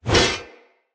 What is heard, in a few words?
Whoosh, Swords; Weapons; Mockery; Turash